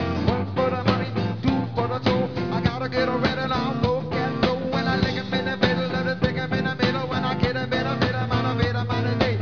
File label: aero rap